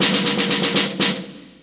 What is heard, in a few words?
Snare Phrase; SpecOps2MPlayerDemo; Music